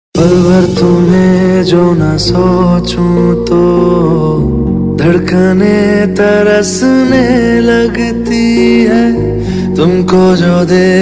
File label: Romantic Hindi Song Ringtone